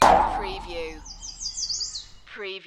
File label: Metal Impact - Cartoon Funny Clonk Vibrato With Birds